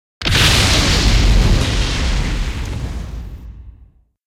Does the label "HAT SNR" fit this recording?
No